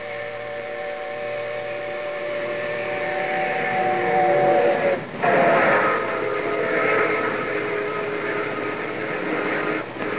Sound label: steam